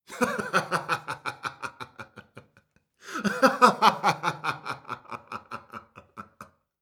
man laughing; human

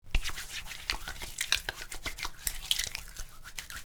soap in hands; bathroom

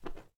flame; nature

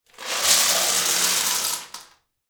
ice cubes; drinks

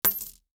coin drop

